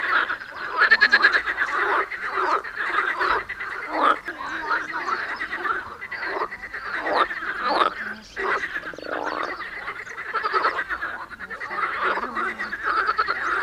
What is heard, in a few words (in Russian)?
hor ljagushek